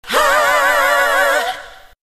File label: "ha" male sung; ooh ahh